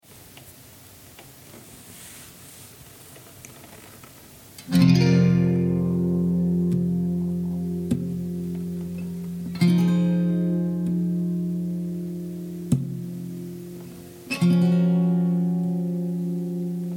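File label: F 100 guitar chords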